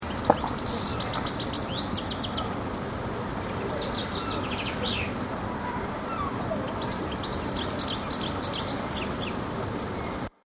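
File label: goldfinch chaffinch, bird song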